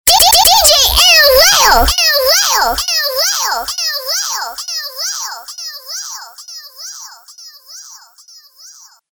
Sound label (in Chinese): 小孩人声